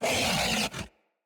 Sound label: Kubrow Feral Vocal Melee Attack